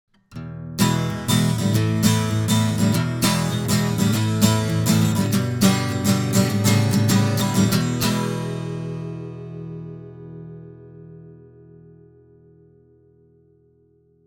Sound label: Acc Gtr vintage steel; Warm Audio